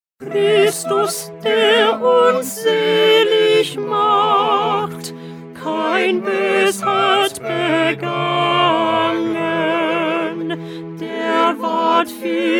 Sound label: Alto; Bach-St-John-Passion-15